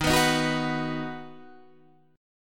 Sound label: D sharp Minor Sharp 5th D sharp down Guitar Standard; strummed; JGuitar